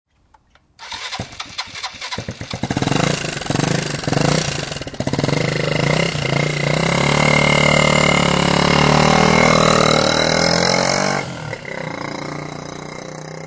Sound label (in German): Motorrad Startet